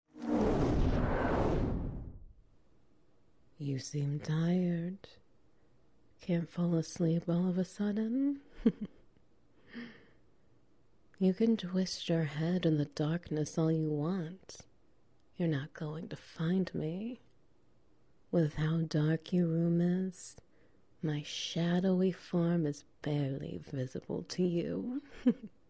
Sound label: SFX Wet Noises